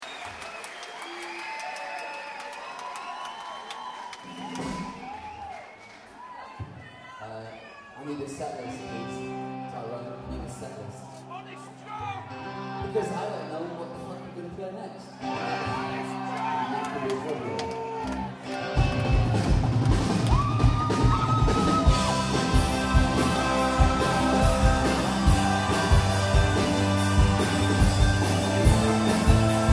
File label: Ring The Bells